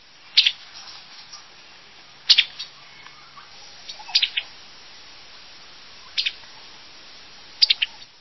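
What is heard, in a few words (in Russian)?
спокойный свист с паузами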